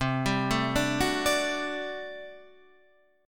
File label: G Major 7th Suspended 2nd Suspended 4th C